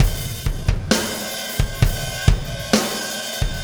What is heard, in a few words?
Impact Crater Beat, Processed Drumkits